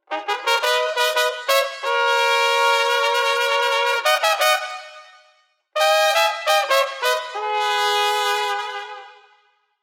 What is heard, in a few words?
trumpet; vegas podcast